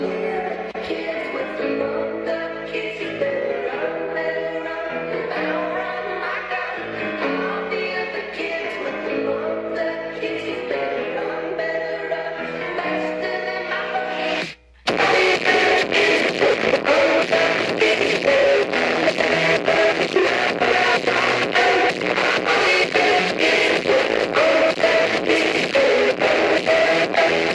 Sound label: loud